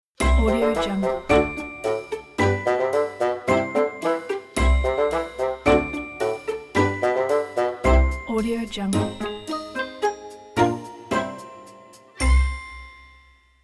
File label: holidays ident